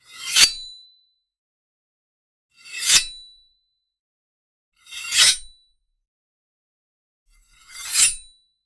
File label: sheath